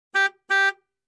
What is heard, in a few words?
Car Horn two times